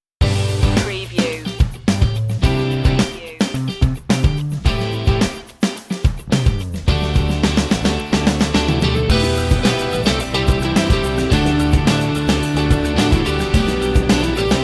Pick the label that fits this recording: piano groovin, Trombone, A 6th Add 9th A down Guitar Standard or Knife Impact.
piano groovin